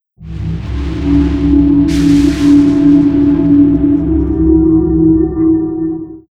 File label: Wind fld; Fly For Insanity